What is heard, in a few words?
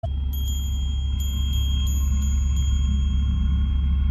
Bells